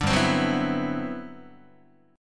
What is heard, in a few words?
G Major 13th, Guitar; strummed; JGuitar